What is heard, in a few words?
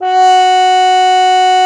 cup mute F#4; Xsample VOL-3